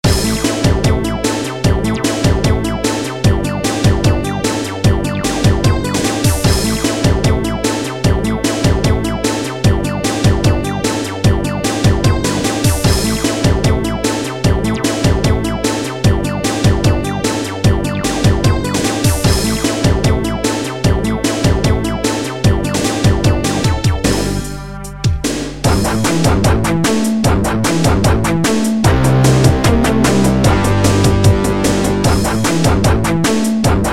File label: techno project lead